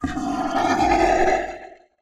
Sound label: horror dinosaur vocalisation or growl